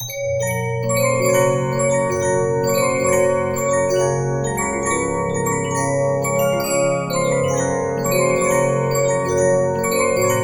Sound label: Regina, 15inch disc musicbox